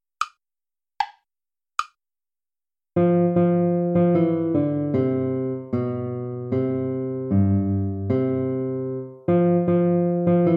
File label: bransle gentil play along; praetorius michael